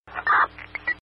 Police Squelch

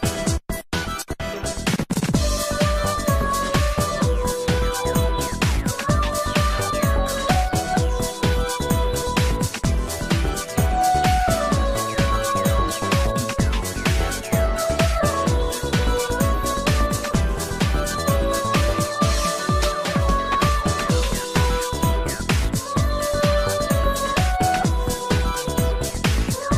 Electronica

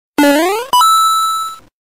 Jump to Coin

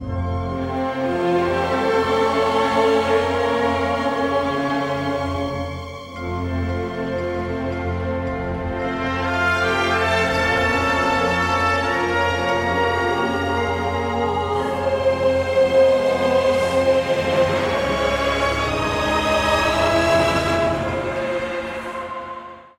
Tune; Kameo

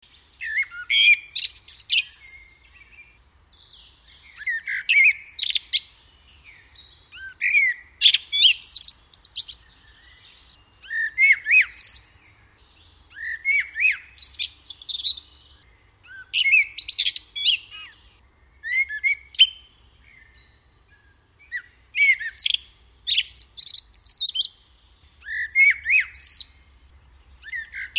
Bird

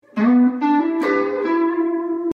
Guitar Electric

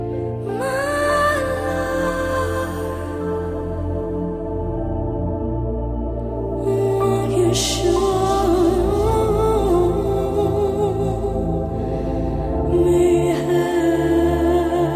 Lovely Ringtone

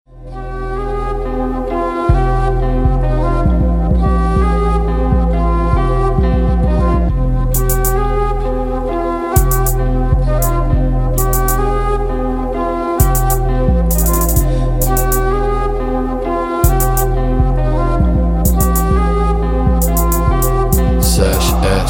Fallen Leaves

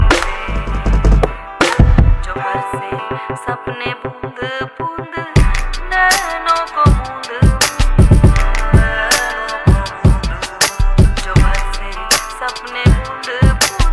hip Hop

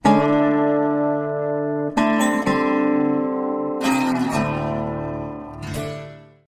Twang Tone; Firefly

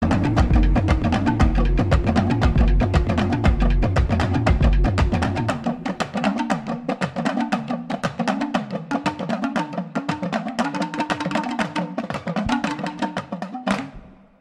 drums ring; Wakanda

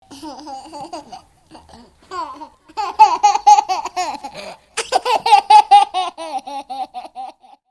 Laughing

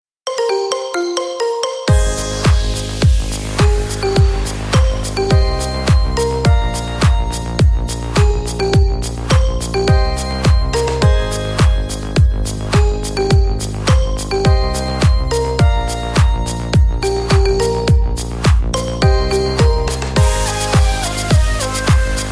Marimba

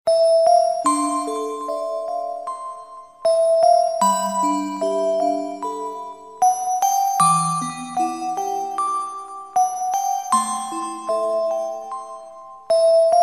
Sad Story

